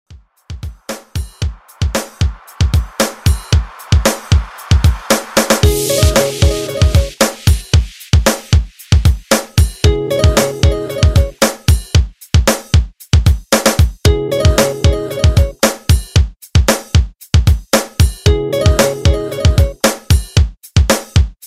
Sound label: Pop Re Drum 114 BPM